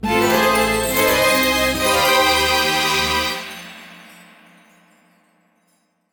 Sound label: Jingle Start